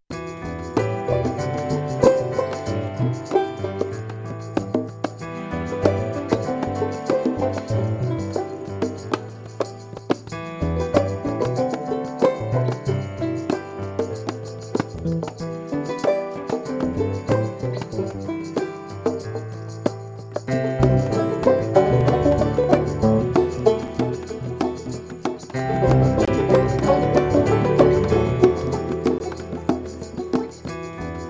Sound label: nude loop; Nude Loop #1; Nude Loops